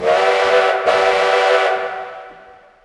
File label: train whistle blowing ringtone